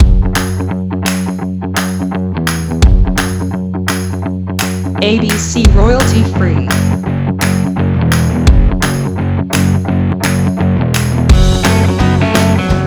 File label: Rock Music